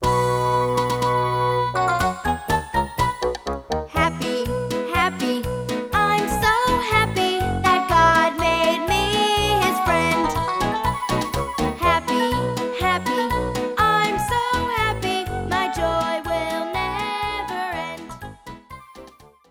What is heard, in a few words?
Sing Along I'm So Happy